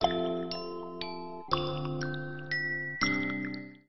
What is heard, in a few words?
bootup; ZTE nubia Z20